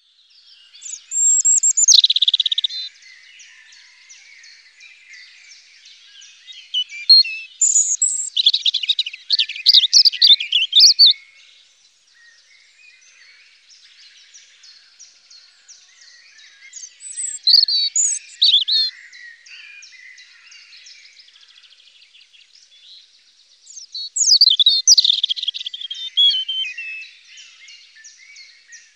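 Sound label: zaryanka golos, European robin voice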